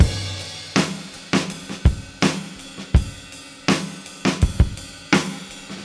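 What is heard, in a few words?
82 bpm, beat loops; Zero-G; Monster Beats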